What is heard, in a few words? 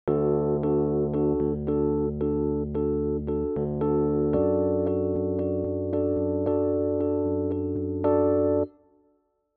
inverted chord